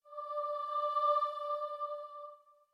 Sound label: starting note, voice; furioso